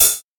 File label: natural pedal hi hat one shot g sharp key